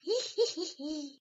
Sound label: Yehehe